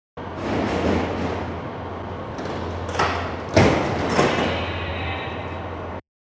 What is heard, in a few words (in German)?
Bahnhof Tueren schliessen